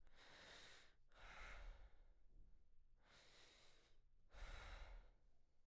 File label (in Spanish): Respiracion